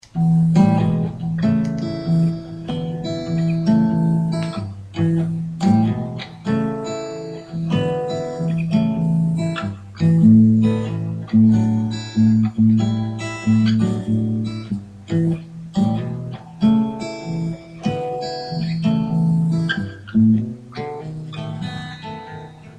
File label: accoustic blues groove in e